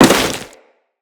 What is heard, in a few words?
Crewman Step Dirt, Footsteps; Enemies; Corpus